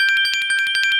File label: coin count; dark king